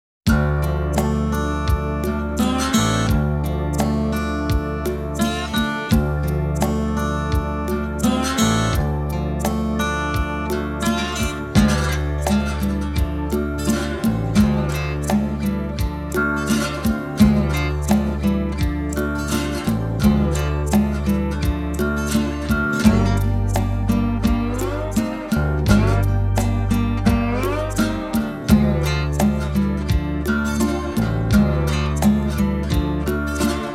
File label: Wind Song; APM